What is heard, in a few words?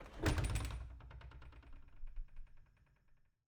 Cabin, front door, split door, oak, large, iron fittings, open, wide; Eiravaein Works; Vaeyan IV